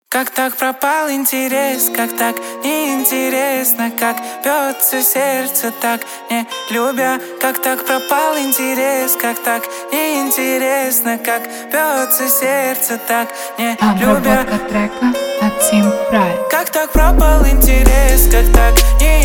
41-46hz Low Bass

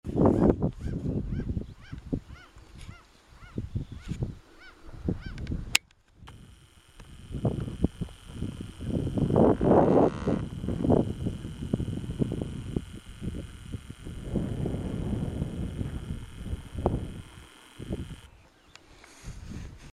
Black-headed Gull Chroicocephalus ridibundus; Ruslan Mazuryk

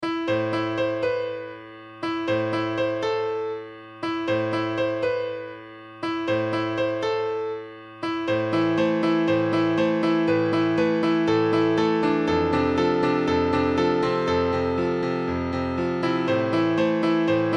Sad Piano

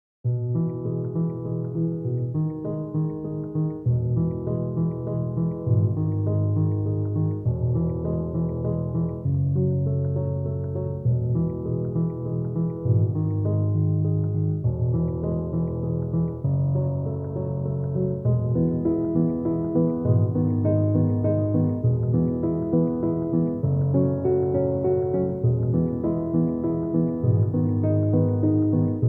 Piano

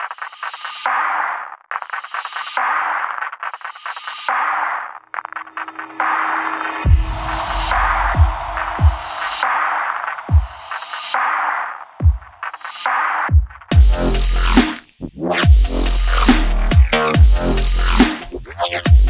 Busted Bass; Steve Murrell

